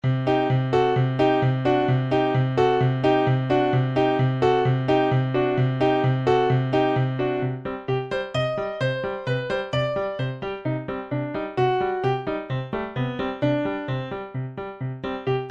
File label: Piano